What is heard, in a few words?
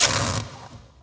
Zap Spit, electrical sparks